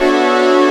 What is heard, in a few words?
dream pad; E-MU Formula 4000 Series Vol. 5; Protozoa; Orbit Presets